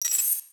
Tech One Off, Ambience; Railjack

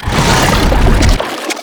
Octopede Lash Limbs Whoosh; Warframe; ManInTheWall

